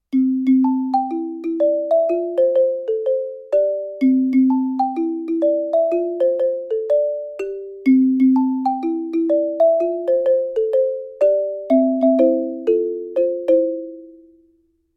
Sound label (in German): AXG 1000 - mit zwei Schlägeln; studio-49